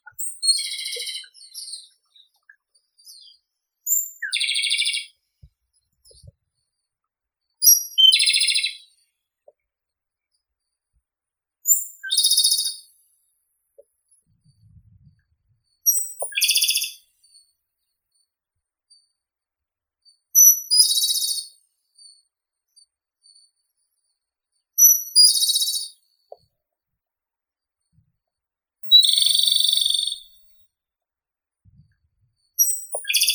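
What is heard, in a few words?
Japanese robin, Erithacus akahige; malinovka yaponskaya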